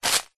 foley footstep single soft shoe forest floor dead leaves twigs; zapsplat